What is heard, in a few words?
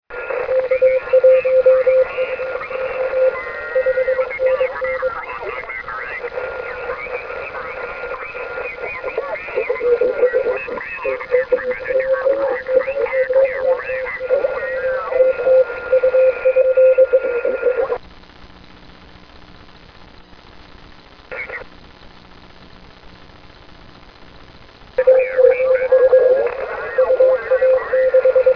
ssb qrm, 160m; 160 Meter; XT2TT; N1BUG